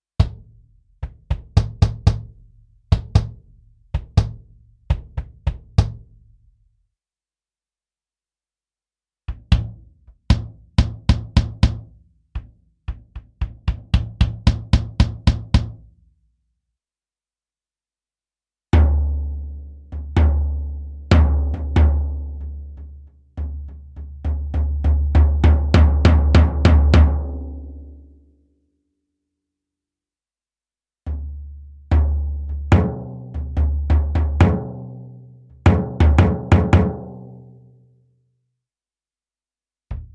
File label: Bass Drums